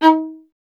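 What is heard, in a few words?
STR VLA D1, strings viola; Miroslav Vitous; Solo Instruments VOL-1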